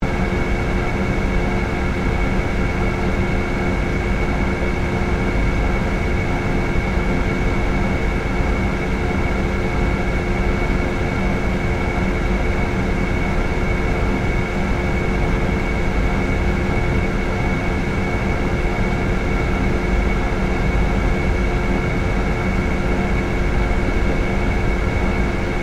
Engineering Room, Industry, Industrial, Engineering, Room, Ambience, Loop; Factory